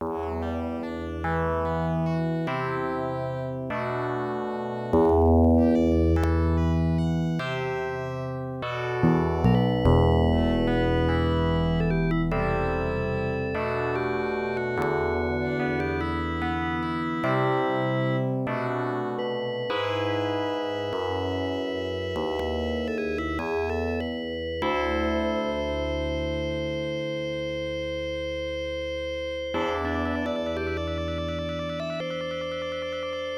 sb 16 ct2740 adlib; soundcard test; spellcasting101; Soundcard test page

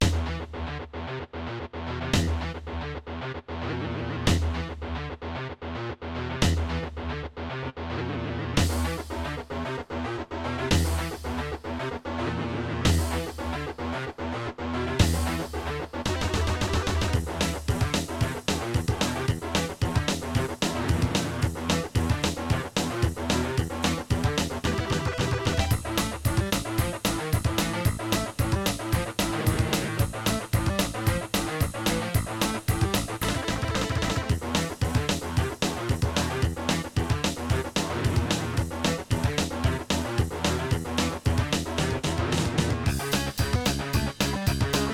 kawai gmega; soundcard test; Doom

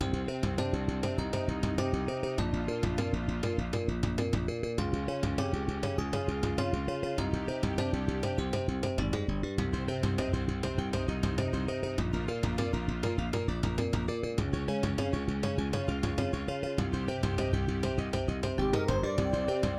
intro, Korg Ag10; soundcard test; Hocus Pocus

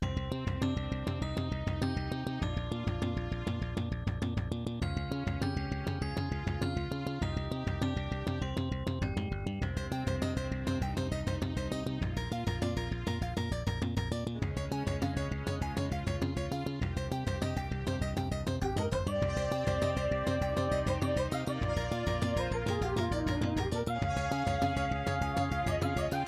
intro, AZTech SoundGalaxy Waverider pro 32-3d; soundcard test; Hocus Pocus

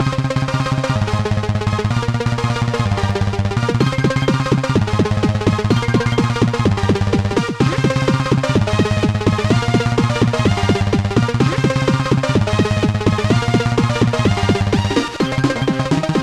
nvidia nv1 (sb); Soundcard test page; One Must Fall 2097

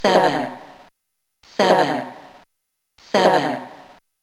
test, sb 15 ct1320; Descent

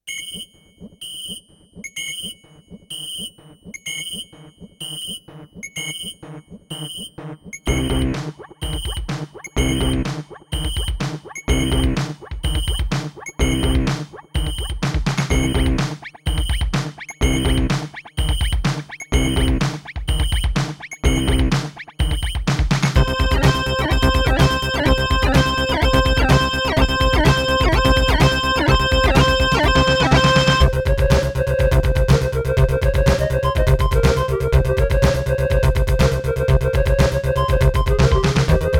turtlebeach tropez; Soundcard test

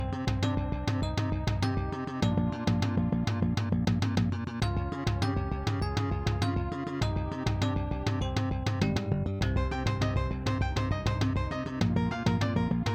intro, Ensoniq Sounscape Elite; soundcard test; Hocus Pocus

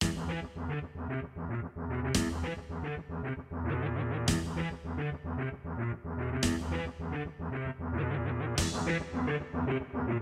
sb awe32 ct3990; Creative SoundBlaster AWE32 ct3990 (external player GM emu); soundcard test; Doom

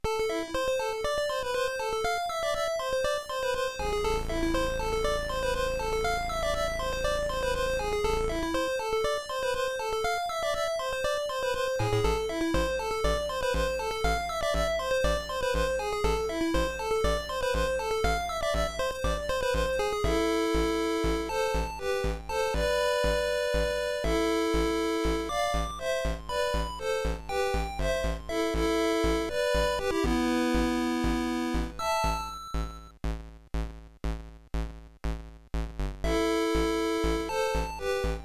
credits sb 1.5 ct1320 (cms); soundcard test; Silpheed

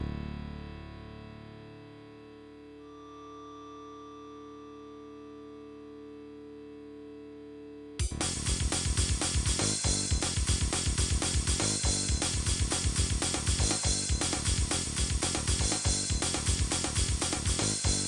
prometheus aria16 (ext); Phrometheus Aria16 (external player); soundcard test; Doom

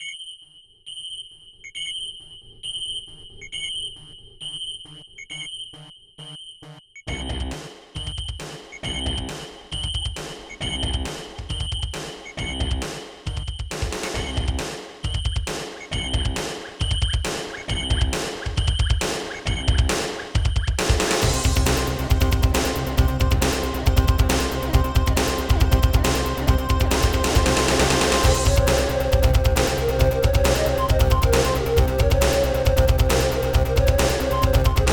intro, korg ag10; Soundcard test

